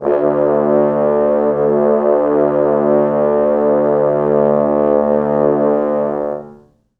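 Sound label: 4 French horns sustain D1; East West; Quantum Leap Horns Sax; 4 FrHorns SUS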